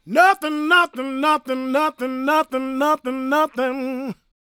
GOSP MALE, Vocal Planet Gospel

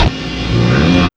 REVERS

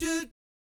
DOOT E4, Jazz; Spectrasonics; Vocal Planet Vol.3 Jazz Fx

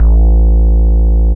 BASS

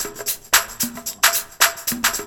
LOOP; E-Lab; X Static Goldmine 2

